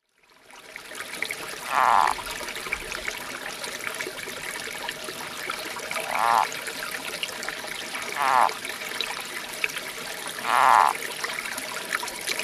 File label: Foothill yellow legged frog